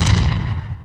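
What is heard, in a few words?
enemy explosion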